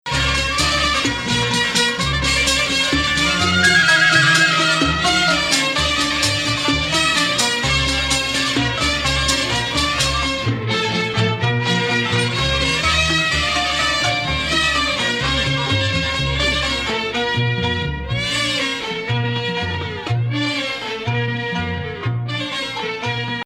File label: Rast Octave, maqam